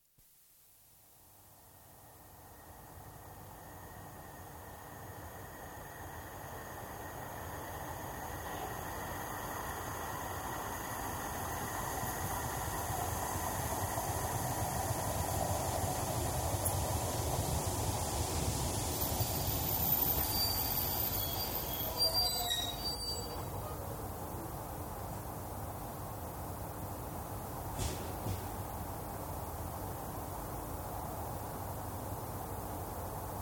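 Steam Train starts into constant run, various acoustic changes as train passes through cuttings, etc, slows & stops in station; Electric Train, exterior, arrives at local station, doors, departs right to left; BBC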